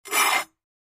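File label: Large Tile Square Scrape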